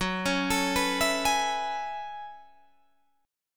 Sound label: F sharp Minor 11th, Guitar, Drop D